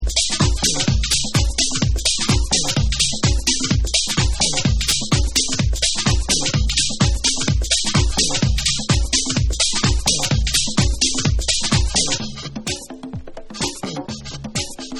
Naked Drums

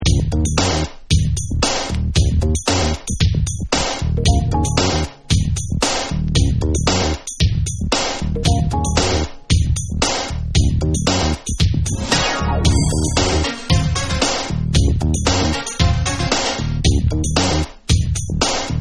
Instrumental

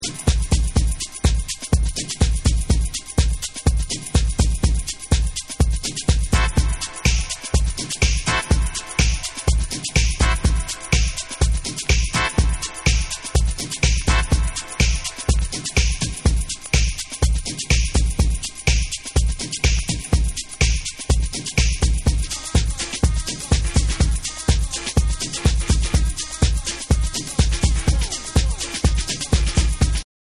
BEATS

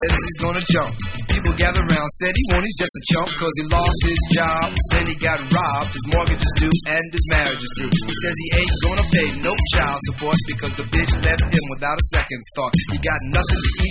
Vocal

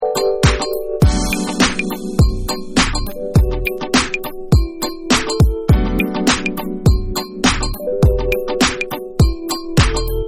Instrumental

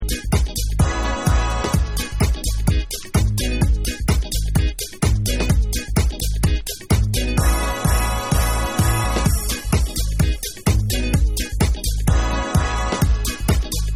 Uplifting Dub

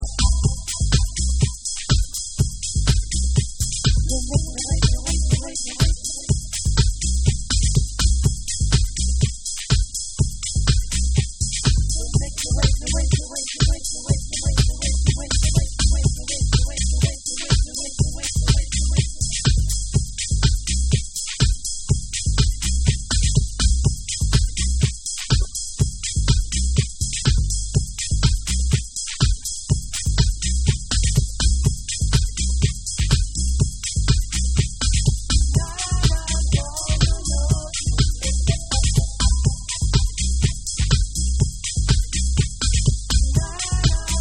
Organ Cut

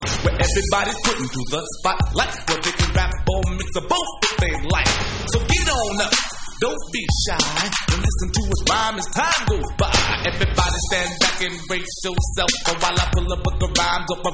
ECHO SCRATCH

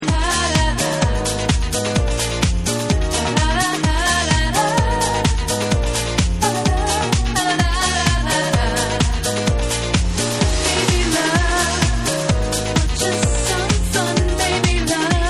Vocal Mix

